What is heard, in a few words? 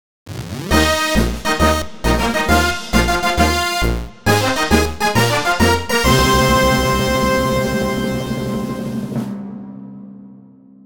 Fanfare; Blue Archive Extra Soundtrack